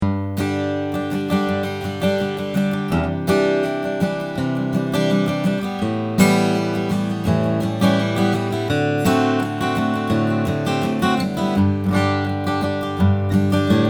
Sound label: ted thompson tmd figured mahogany; 1999